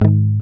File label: G#, Acoustic; Sound & Vision; Gigapack I CD 2; GUI ACOUST.; GUI Acoustic 1